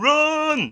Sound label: run